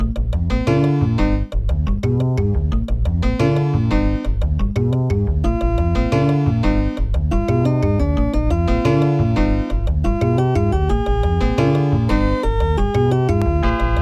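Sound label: level1, Gravis Ultrasound PNP GM emulation megaem; soundcard test; Hocus Pocus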